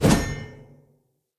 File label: Player Sphere Polearm Heavy Whoosh; Weapons